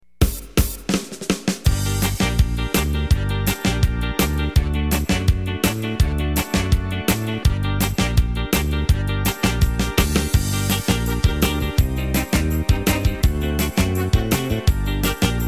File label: twist; yamaha